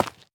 凝灰岩破坏声

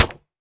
泥砖 破坏声

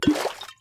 玻璃瓶装水声

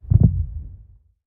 监守者心跳声

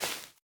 孢子花踩踏声

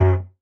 音符盒迪吉里杜管声

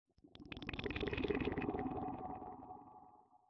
潮涌核心短声

